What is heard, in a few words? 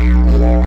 Didgeridoo